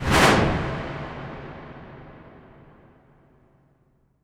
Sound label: Impact Wipe, Cinematic